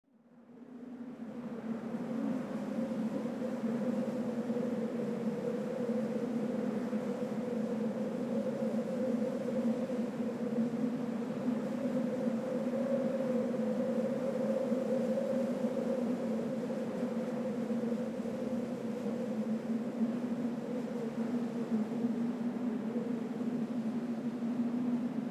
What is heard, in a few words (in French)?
bruitage vent